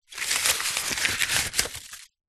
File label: leisure board game money notes bills handling; monopoly